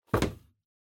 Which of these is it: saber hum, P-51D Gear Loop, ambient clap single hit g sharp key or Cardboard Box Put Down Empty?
Cardboard Box Put Down Empty